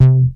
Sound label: Bass Synths hits and octaves